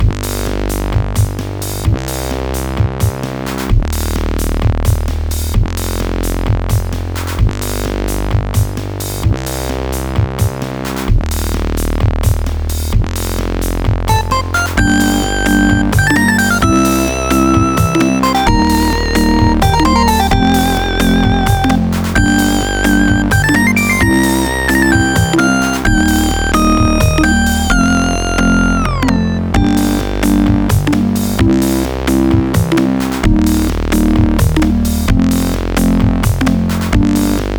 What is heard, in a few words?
Funky; Underhero